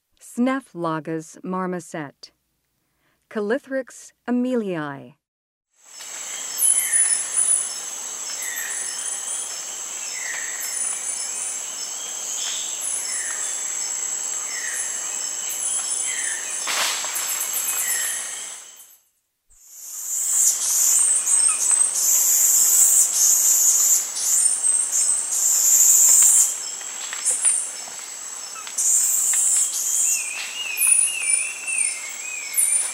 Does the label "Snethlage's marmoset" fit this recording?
Yes